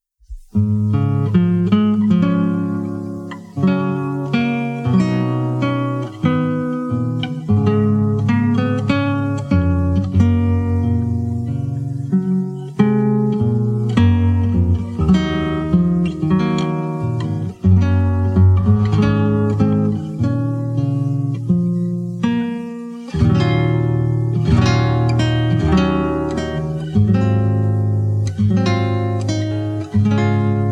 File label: Song for Guitar Solo